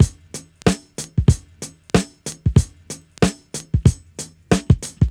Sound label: dumped beats